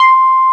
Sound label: SUP BASS; Syntec; Wall of Sounds